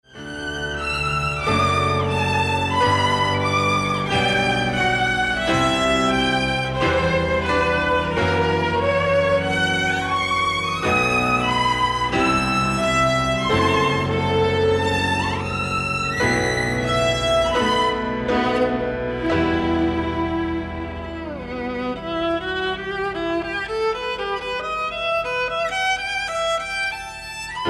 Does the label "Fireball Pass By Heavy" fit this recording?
No